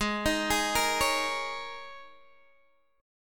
Ab Suspended 2nd Suspended 4th Ab down Guitar DADGAD